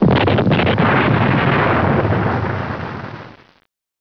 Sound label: M EXPLODE; bugs